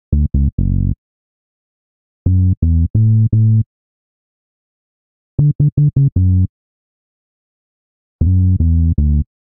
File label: Bass F# Minor 85 bpm, Bassline; MIDI Clips; Tonal; Beat That; Ableton; Factory Packs; Chop and Swing